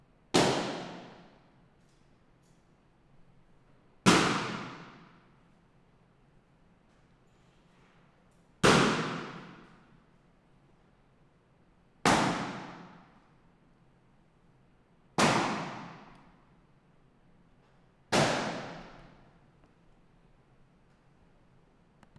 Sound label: Balloons